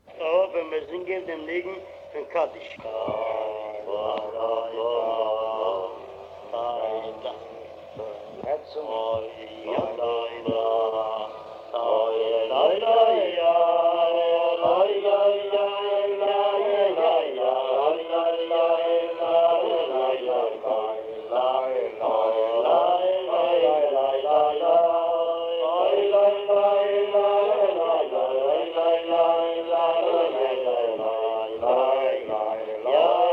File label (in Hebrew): ניגון; ניגוני הסולם; ניגון קדיש